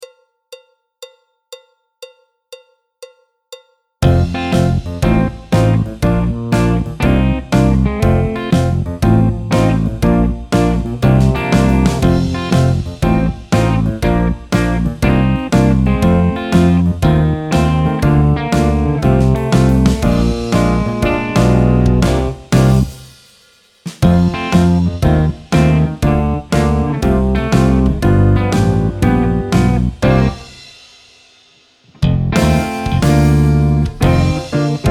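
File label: backing track, Jazz; alto, trombone, piano; Jazz Syllabus Level 1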